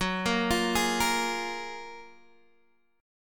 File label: F sharp Major 9th, Guitar Open G